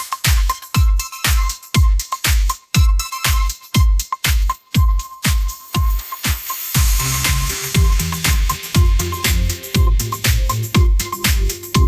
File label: pop adventure, ringtones; ai